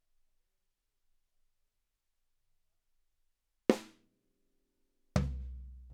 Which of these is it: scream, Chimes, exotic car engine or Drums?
Drums